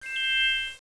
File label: Star Twinkle; Koji the Frog 2.0.1